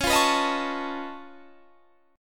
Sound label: C sharp Augmented 9th C sharp down, chord